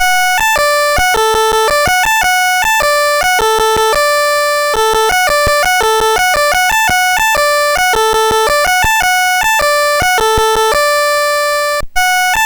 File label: Bugle Music BASIC, MOS6581R2